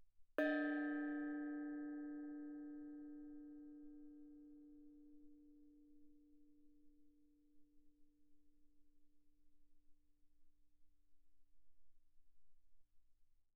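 Thai gong D4 mezzo forte, Percussion, gongs & tamtams; thaigongs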